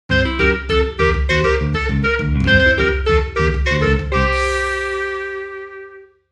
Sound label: Plot transition theme break sitcom